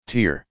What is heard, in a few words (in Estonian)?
heli